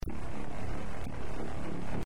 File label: beat